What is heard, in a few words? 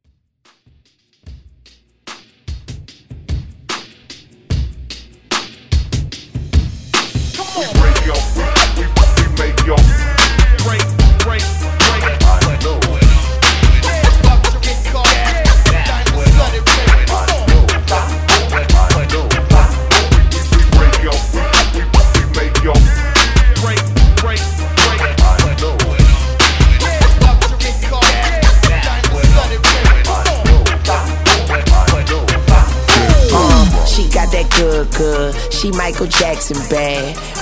Throwback Redrum Hype